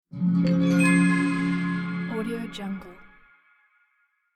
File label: magic notification